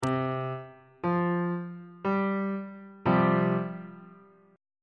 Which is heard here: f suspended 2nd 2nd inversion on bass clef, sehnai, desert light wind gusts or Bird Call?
f suspended 2nd 2nd inversion on bass clef